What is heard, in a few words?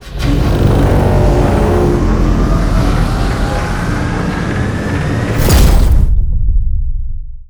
rampart close